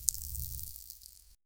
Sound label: hair dragging